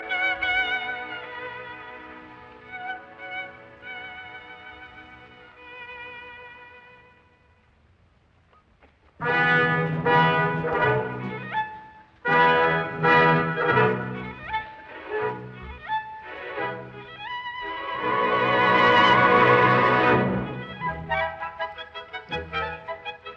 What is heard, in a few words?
Violin Concerto in E minor Allegretto non troppo Allegro molto; Mendelssohn; Op 64; vivace